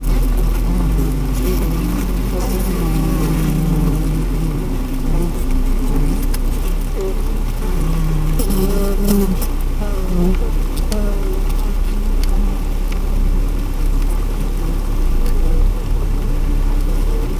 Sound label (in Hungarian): méhkas, mézelő méh; parasztudvar